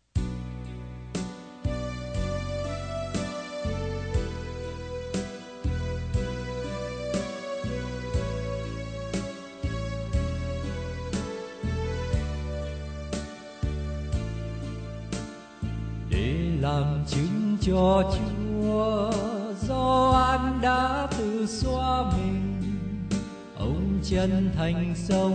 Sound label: BASS